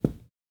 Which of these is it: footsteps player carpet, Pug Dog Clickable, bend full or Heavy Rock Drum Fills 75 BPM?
footsteps player carpet